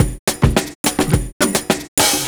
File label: 70s Breakbeats; USB Soundscan vol.46; AKAI